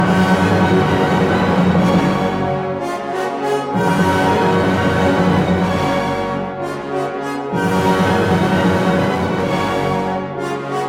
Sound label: 4th Movement Horn Theme; Bruckner 9th; Rattle